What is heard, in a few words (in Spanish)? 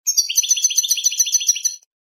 bibleos simples, campero